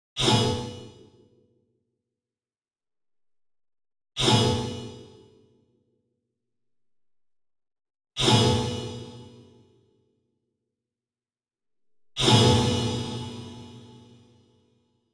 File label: Bright Metal Impacts